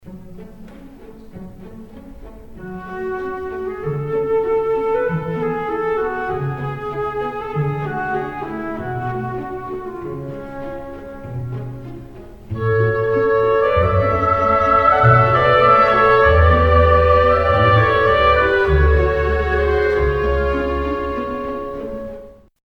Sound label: faure pavane opening fast